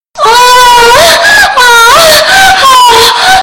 moaning

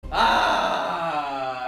aaaaaaah

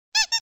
squeak

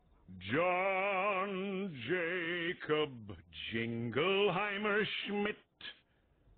john jacob jingleheimer schmidt

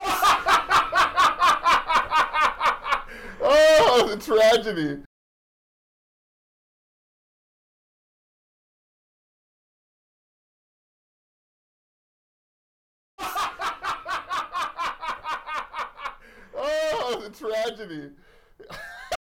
laugh

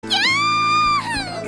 kyaaaah

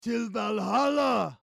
sub sound; dlive

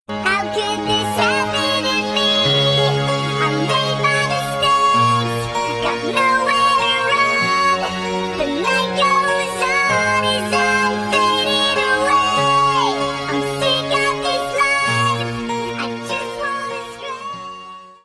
sad song; ftv

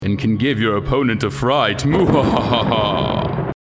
fright laugh; Drac Laugh React

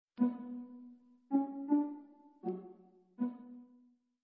music game

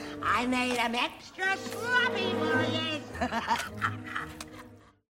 sloppy laugh

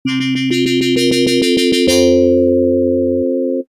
mission complete

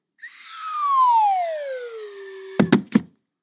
falling down

